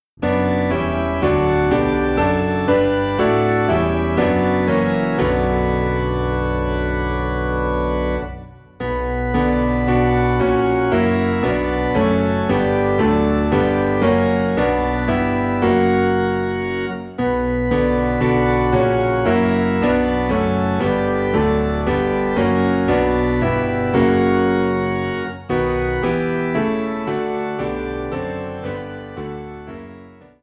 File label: Piano and Organ Low in B Minor; christmas; God Rest Ye Merry Gentlemen